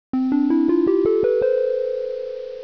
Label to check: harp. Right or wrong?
Right